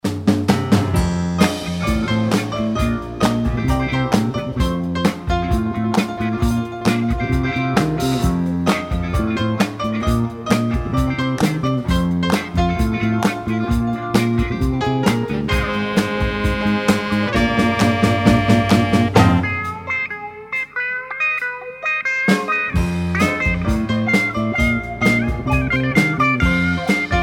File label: Fender Strat solo, B-Flat